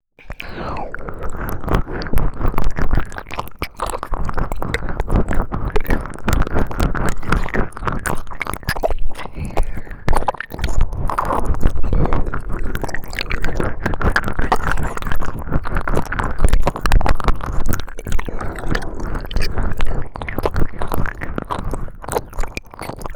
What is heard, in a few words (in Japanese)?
W耳舐め; 反転